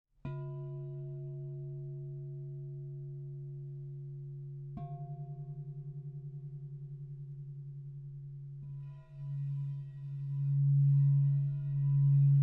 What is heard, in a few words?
crybowl